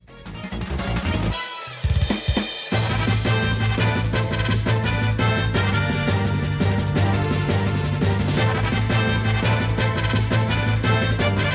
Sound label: Boss Battle Theme; KSS; SSBB